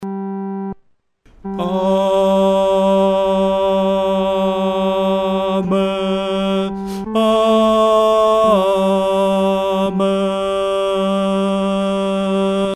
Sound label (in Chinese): 阿门颂 独唱（第三声）; 阿门颂（一）